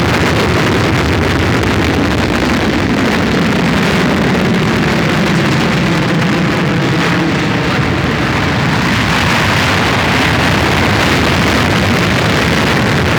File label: Pursuit Space Ship Close Loop; Grineer; Vehicles